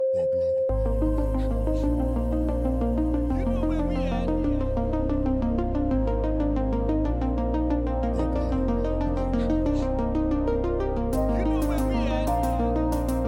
POP EDM

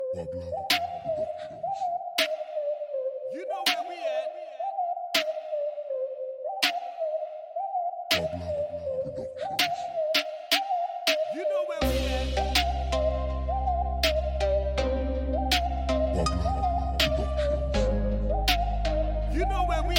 Reggae Dancehall

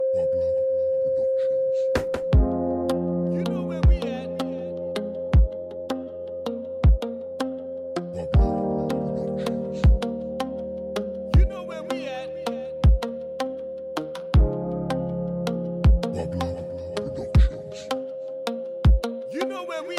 Reggae Dancehall

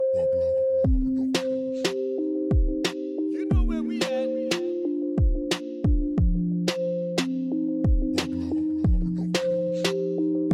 Reggae Dancehall

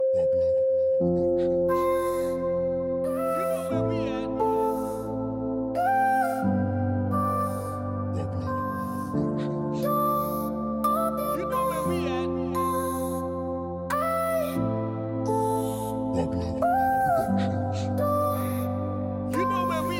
POP EDM

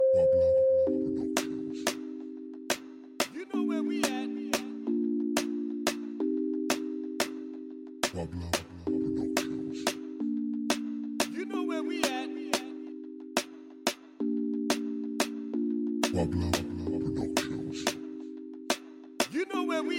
Reggae Dancehall

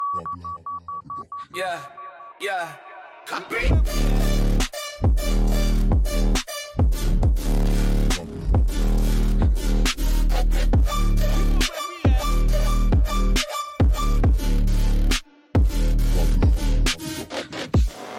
Time Loop Trap

